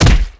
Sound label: hit punch; zoochosis; warthog